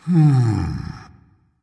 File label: thinking, voice; smite; voicepack; ymir